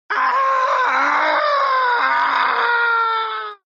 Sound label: ahhhhhhhh; sword coast legends